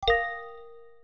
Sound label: joyful, notifications; OnePlus 6 Ringtones